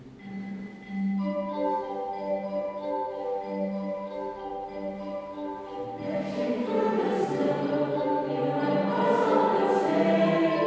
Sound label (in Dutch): Jingle Bells, Koor